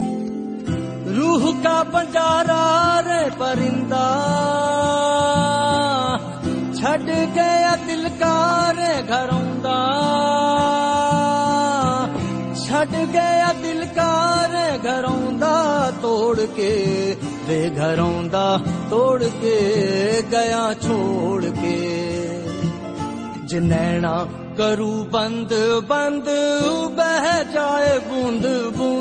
male version, ringtone

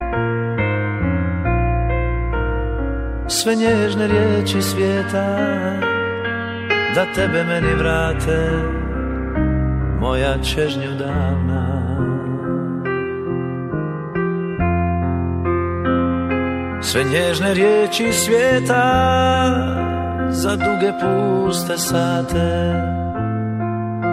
romantic croatian

